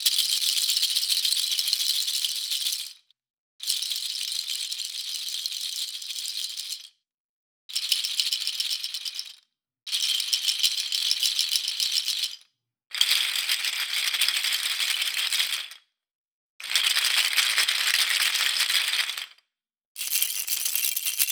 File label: Raw Perc Rattles